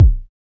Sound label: Kick; Samplepack Produtique